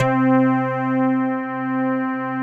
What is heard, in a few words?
HYBRID BASS; Greytsounds; Scott Peers Sound Engineering